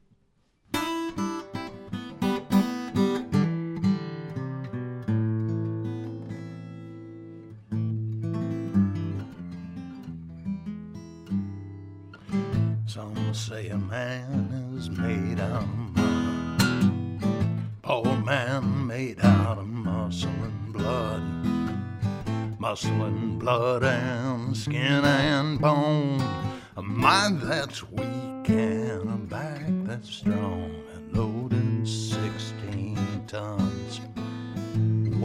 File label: 460, Ward Beck 460; Yamaha PM1000 modified EQ, unbalanced output